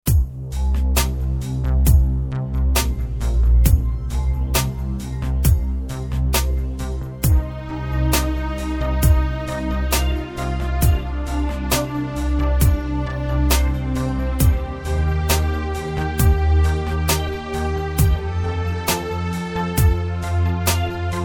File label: Vintage Pad